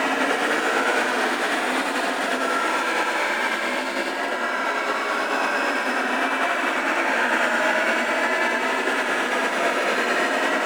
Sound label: Aura Projectile Loop; Warframe; Anima Animus